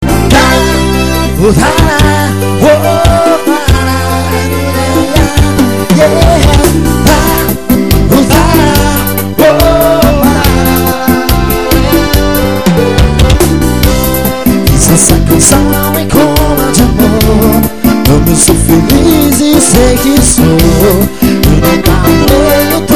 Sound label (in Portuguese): forro